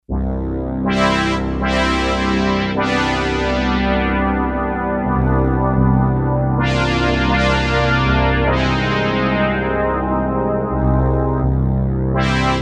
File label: Kawai K5000S